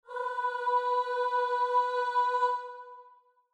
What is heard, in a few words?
starting note, voice; giocoso in g major